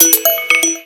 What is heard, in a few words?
Nanotubes alert, notifications; Motorola Droid-4